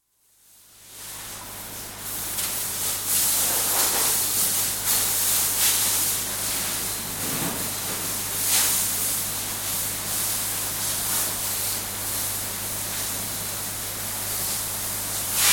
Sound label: Milking Parlour, interior, washing down parlour, bulk tank humming in background & sparrows chirruping; Cow; Cattle; BBC